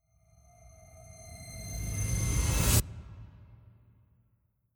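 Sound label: Future Bass FX Riser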